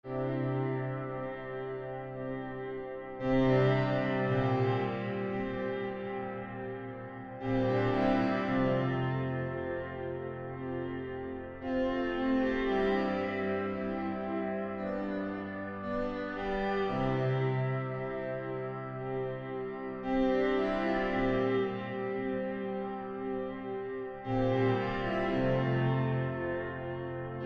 Classic Piano Attack; KeyZone

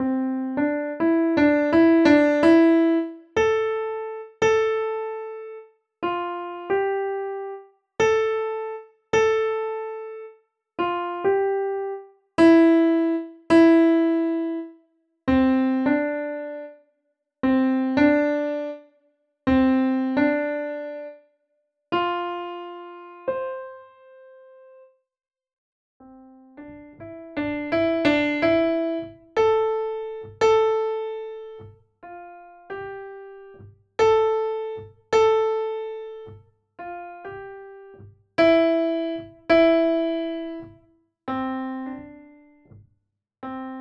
Augmented Piano Velocity 4

